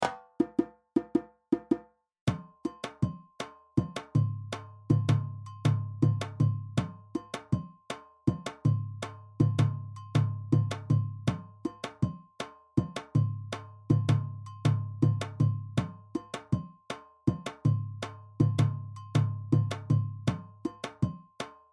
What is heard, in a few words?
Sangba + Djembe; Djembe 1